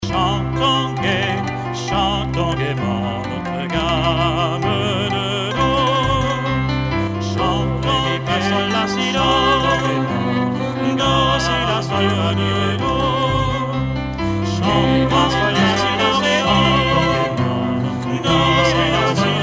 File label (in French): La gamme canon 3 voix; Ecoles Qui Chantent 1999-2000